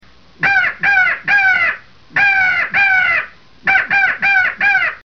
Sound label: attention, crow